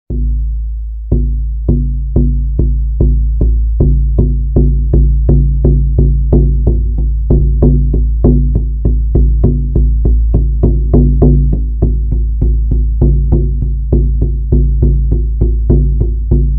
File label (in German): Earth Drum (mit Rückholsignal)